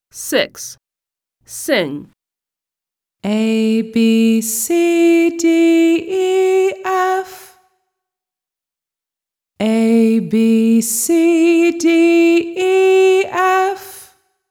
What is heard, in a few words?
song ABCDEF; American; My alphabet book; Smart Book 1